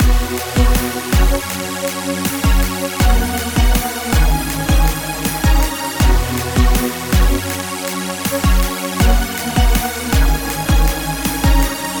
Backing

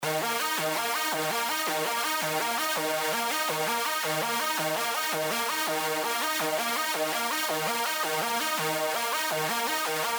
Synth

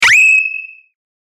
pachinko

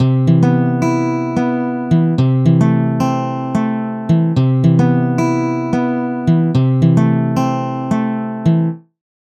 Cmaj7 C6

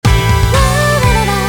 Bass on

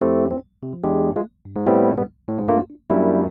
Vintage keys; Scarbee

